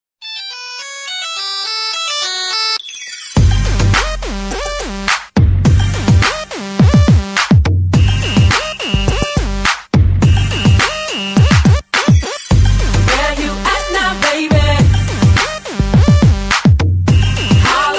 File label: nokia hip hop